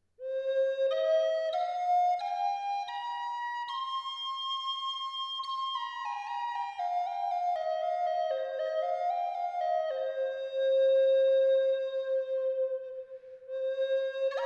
Haselbaum Cis hoch 432Hz

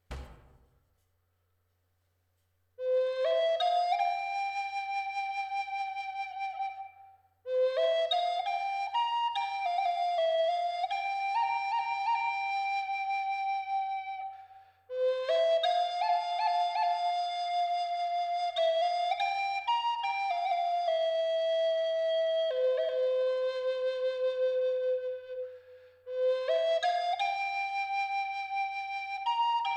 Walnuss Solfeggio 528 Hz

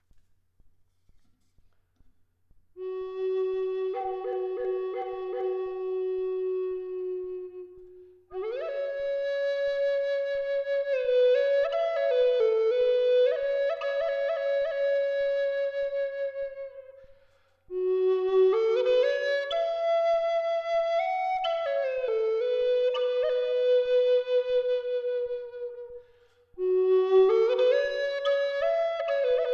Astflöte Ahorn G 432 Hz; Crysokoll; Feder

